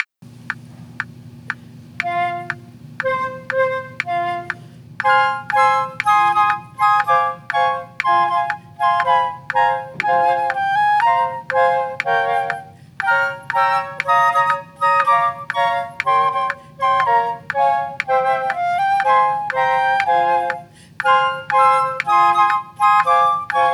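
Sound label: quartet with percussion, habanera; flex-ability classics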